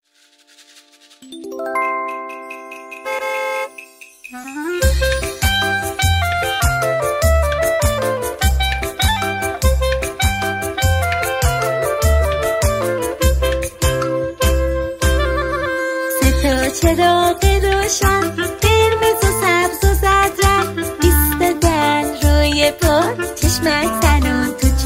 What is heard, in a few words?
traffic light